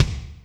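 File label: kick Dbl HL, Alesis D4 drum machine; 300 Drum Machines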